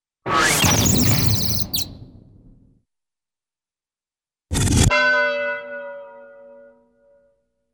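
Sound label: Reverberant Boom with bird Chirps, Cartoon; Hollywood Edge; Cartoon Trax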